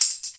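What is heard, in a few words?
tambourine; InVision Interactive; Percussion Slam; KWICKIE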